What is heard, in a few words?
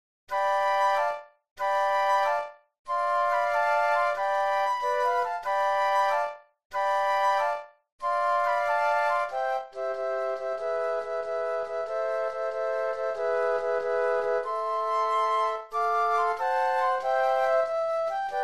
Flute Trio